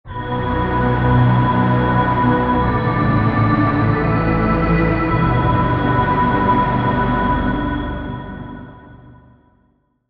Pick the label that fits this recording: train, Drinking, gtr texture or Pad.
Pad